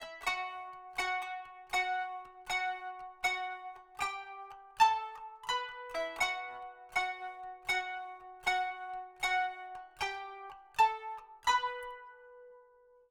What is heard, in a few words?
kanun